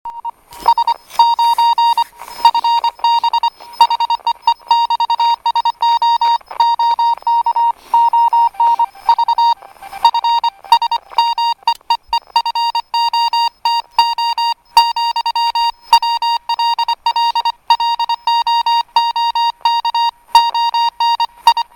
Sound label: RX DSB